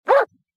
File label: Bark; Quarry; SoundBirdPuzzleSection